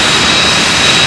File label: B-747, Model; FMS; JAL